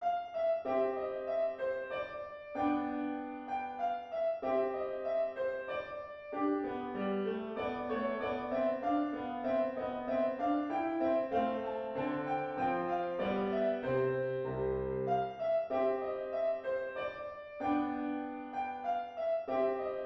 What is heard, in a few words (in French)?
danse allemande